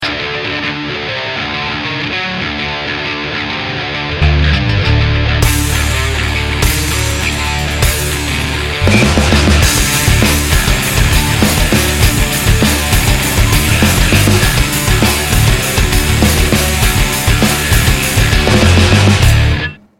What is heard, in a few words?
power metal